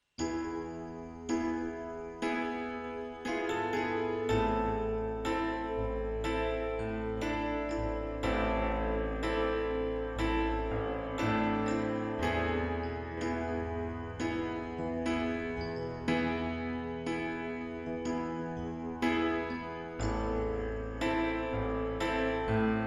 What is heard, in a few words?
ocean moon piano